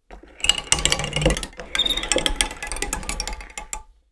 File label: Gear mechanism rotating clicky; gears